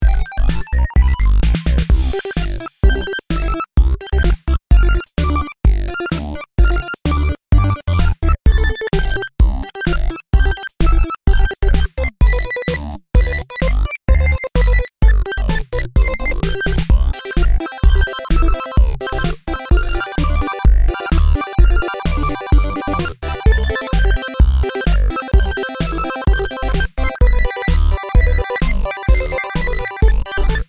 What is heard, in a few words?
test song